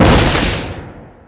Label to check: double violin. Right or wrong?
Wrong